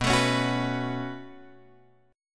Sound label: B 7th Suspended 2nd B down Guitar Drop D